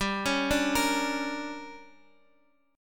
D Major 7th Suspended 4th Sharp 5th, chord

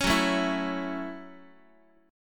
Gb Minor 7th Gb down Guitar; strummed; JGuitar

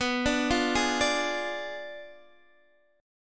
B Diminished 7th B down Guitar Open D

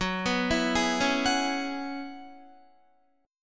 Gb 7th Suspended 2nd Suspended 4th Gb down Guitar; arpeggiated; JGuitar

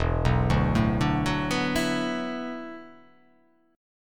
E 7th Suspended 2nd Suspended 4th, down

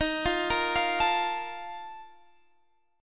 A sharp Major 7th Suspended 4th

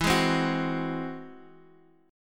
G sharp Augmented 7th

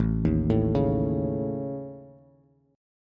A Major Flat 5th, Bass; arpeggiated

